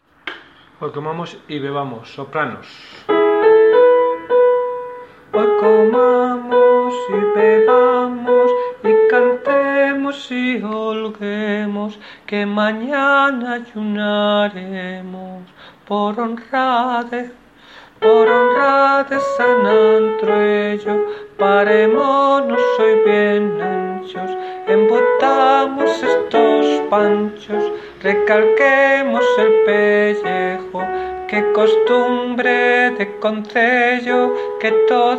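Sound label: soprano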